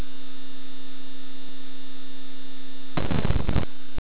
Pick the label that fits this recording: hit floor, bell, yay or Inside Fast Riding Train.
hit floor